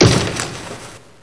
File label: rope; SFX; PC Gamer IT CD 38; thief